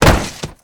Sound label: bodyfall stone